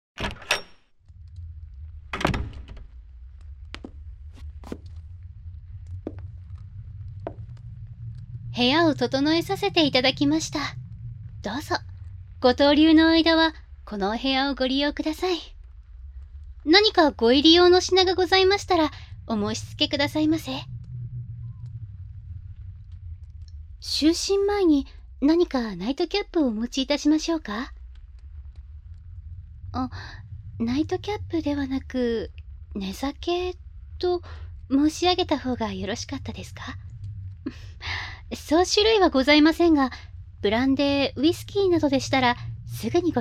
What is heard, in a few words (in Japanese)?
メイドがおやすみフェラ; 寝床で